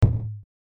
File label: Drum End